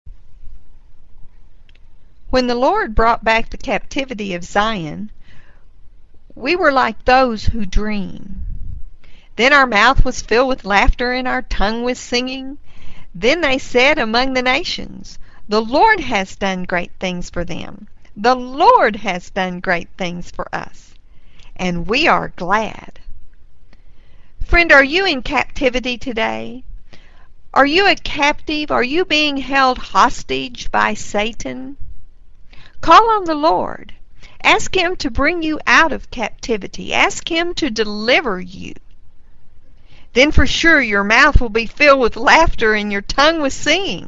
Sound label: Laughter